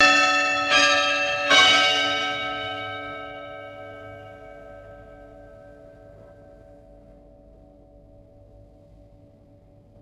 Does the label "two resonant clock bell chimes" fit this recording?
Yes